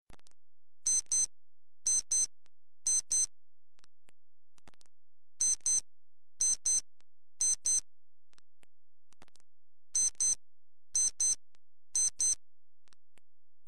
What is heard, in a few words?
Clock alarm